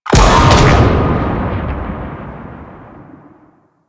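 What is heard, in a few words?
Pylon Projectile Fire; Enemies; Weapons; Warframe; Corpus; Arachnoid Camper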